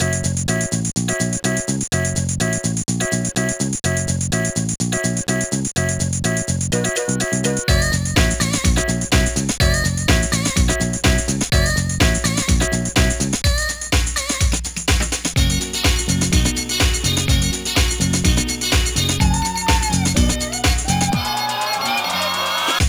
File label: BOO